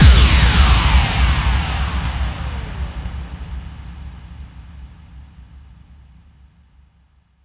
FX Kicks; Effects; Vengeance; Essential Clubsounds Vol.4

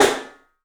PIC SNR L, PICOLO ROOM, 3D Drums; 3D Sound

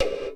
PERC GATED; Crypton; DrumBase5000

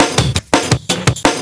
LOOP SD, 168 BPM, Drum & Bass

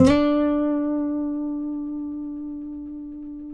FINGER, Guitars; TAKA

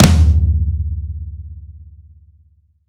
F. Tom, Roland BK-5; Toms

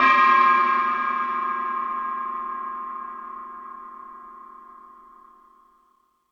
Lazer Drums, E-MU Pro-Cussion; drum machine

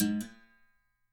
BER BEND, Percussion; Sampleheads; NYC Percussionworks; ST PERC VOL1

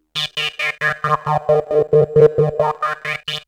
ARP, House arp loops; Best Service ProSamples vol.10 - House; AKAI

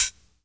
HH, Dance Mega Drums; Best Service; HH-HIP

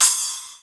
SPLASH; E-MU Producer Series Vol. 8 – Platinum Phatt

